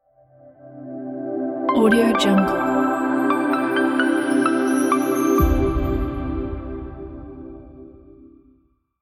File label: magic logo reveal